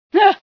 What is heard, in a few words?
scream, female sci